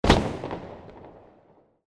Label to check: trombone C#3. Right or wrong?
Wrong